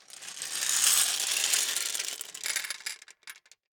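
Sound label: Gumball machine, metal, glass, candy, flip, jostle, clatter, slow, hyper; Eiravaein Works; Vaeyan IV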